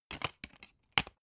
dvd case put in dvd

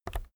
keyboard external key release soft

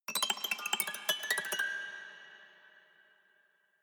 haunted strings weird stroke tinkle soft

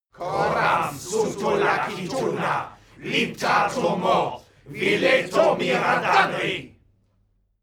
male crowd evoke

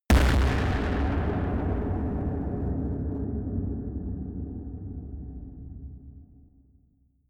distorted explosions stereo

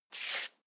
marker line medium

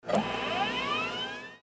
printer machine

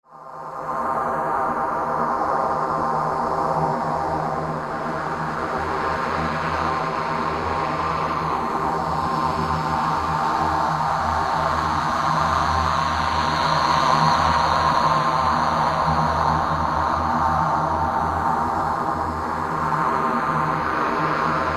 electric

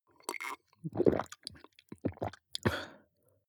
drink glass milk swallow large

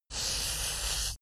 paper cloth dragging

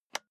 office chair lever

